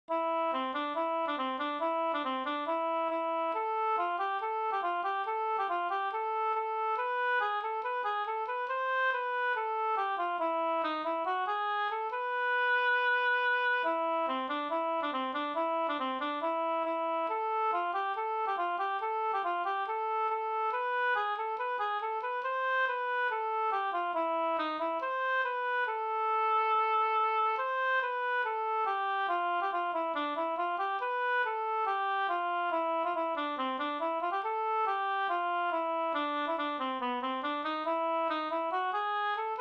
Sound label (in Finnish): humppa; Cykelhumppa